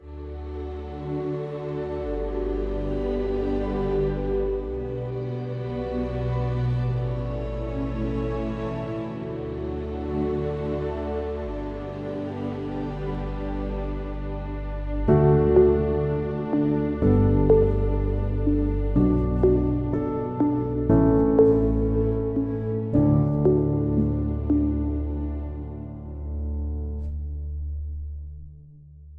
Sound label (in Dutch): Bloeddruk campagne tune; Hartstichting